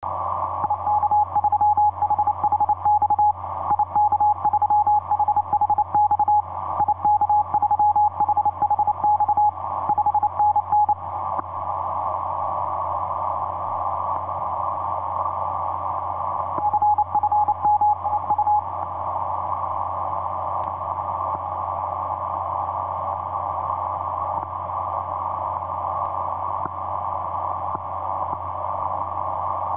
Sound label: 30m cw; tx3d; ik3hhx